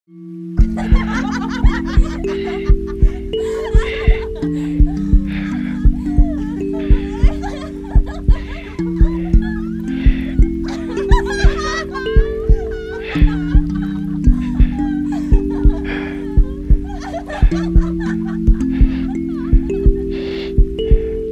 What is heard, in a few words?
kalimba